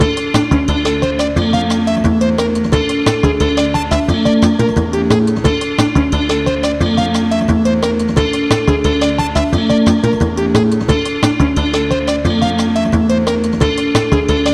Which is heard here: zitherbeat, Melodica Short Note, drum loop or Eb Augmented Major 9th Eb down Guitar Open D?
zitherbeat